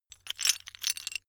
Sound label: metal pieces clatter short